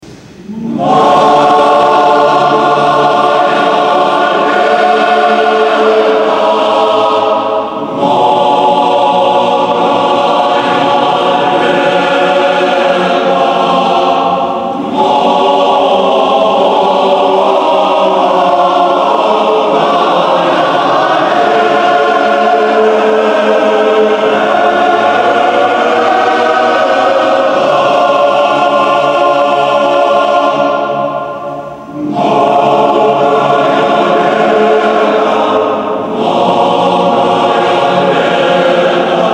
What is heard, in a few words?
male choir; mnogayaleta; male choir of mephi